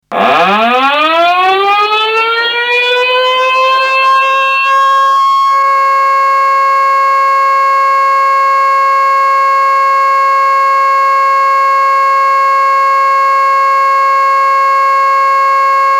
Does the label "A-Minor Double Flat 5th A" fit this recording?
No